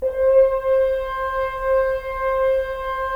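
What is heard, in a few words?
Solo French Horn, Brass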